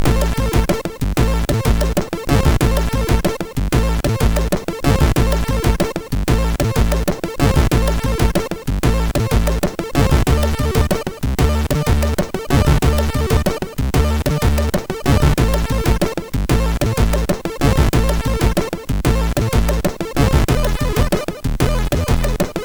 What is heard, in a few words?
Tune MOS6581R4